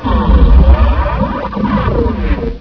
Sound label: idle, panther; svencoop; custom monsters